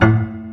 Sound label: HARM A2, PF MIX; AKAI S-Series CD-ROM Sound Library VOL-2